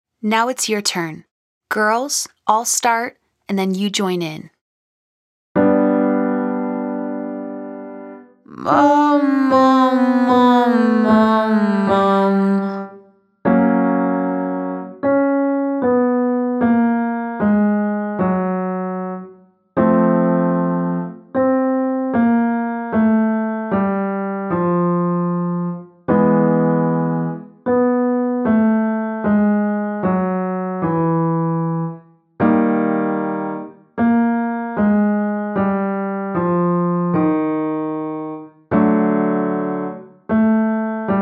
Mum to Vocal Fry 5 Note Scale Female Version

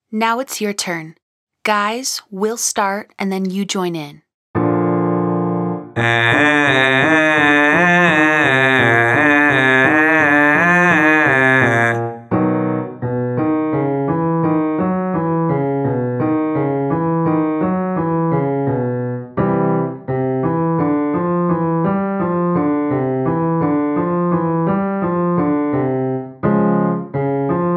Ah as in Bat Bouncy Scale Male

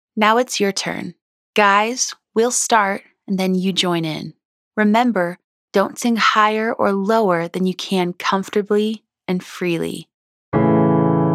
Wah Let Go Exercise Male Version

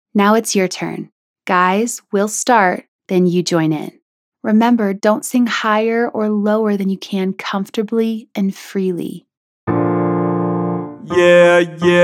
Yeah Desc 5 Note M

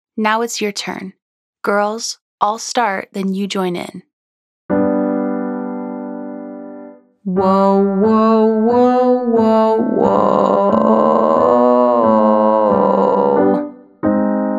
Clear to Edgy Woh 3 Note Scale Female Version

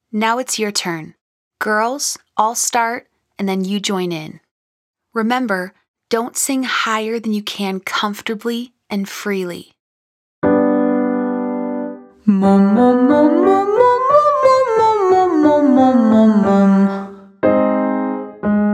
Moom Moom Moom Long Scale Female Version

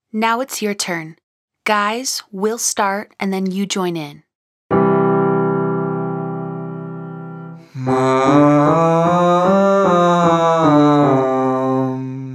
Exhale the Mum 5 Note Scale Male Version

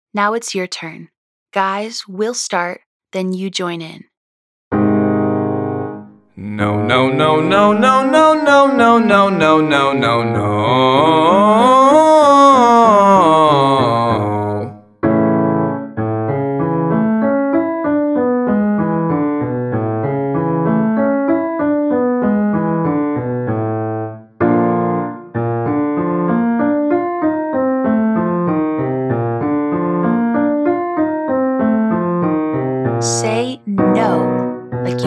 No Double Long Scale Male

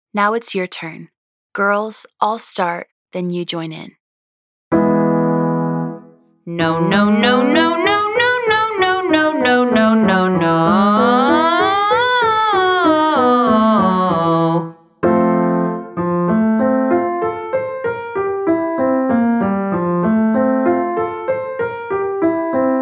No Double Long Scale Female Version

